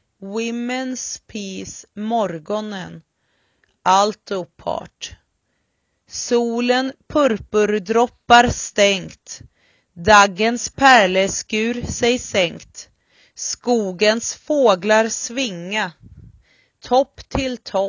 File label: Alto